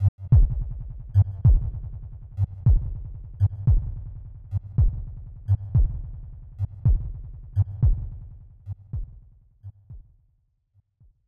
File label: Pre-Battle Tension; Dragon Ball Z: Super Butouden 3; SNES